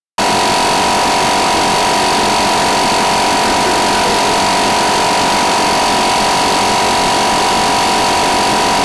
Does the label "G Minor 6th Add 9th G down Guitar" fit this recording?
No